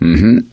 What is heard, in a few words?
positive noise, gambler, survivor voice; source engine; left 4 dead 2